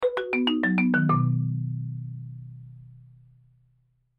Xylophone Arpeggio Descend Double Octave; Mallet; Musical; Cartoon

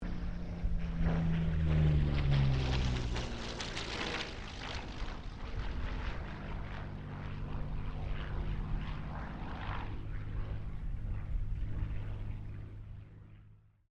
AIRCRAFT P-40 KITTYHAWK PASS STEREO; Airplanes

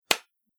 Single Hand Clap; Body Sound Effects; Human Body

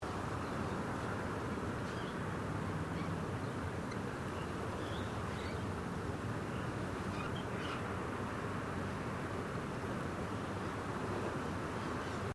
Bird Blackbird Atmos No Calls; common Australian birds; Animals

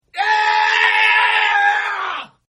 Yell; Partners In Rhyme

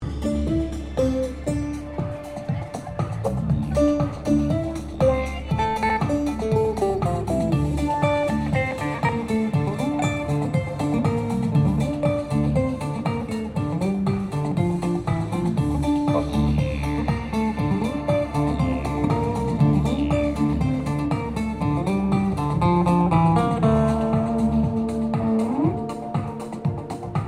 Ambience Busker Didgeridoo Fusion; Melbourne buskers; Concerts; SoundEffects-Foundation1

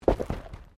Body movement Impact Roll; Body movement foley to simulate falls and deaths; Rolling; Objects

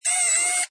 Servo Sml, Servo Panel Sound, small; Buttons; Multimedia

